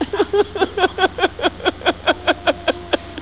HAHA; (Laughs); Primetime Glick

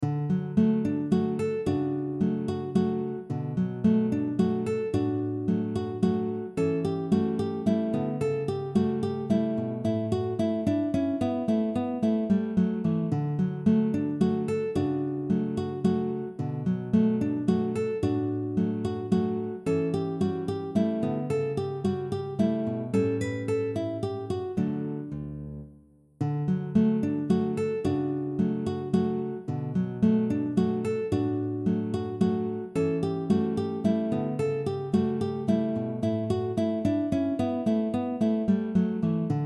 folk waltz

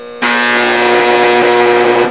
pig arc

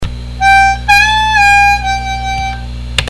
draw

